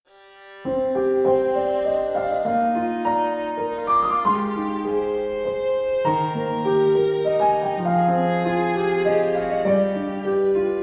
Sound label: Piano